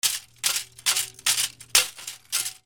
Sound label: BREAKING